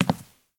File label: Step Wood